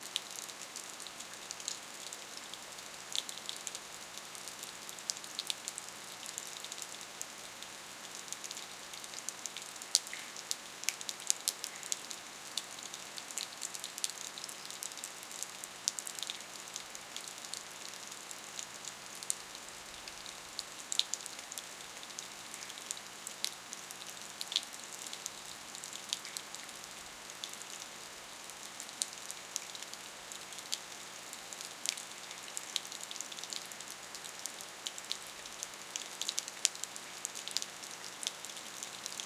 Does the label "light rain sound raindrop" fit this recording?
Yes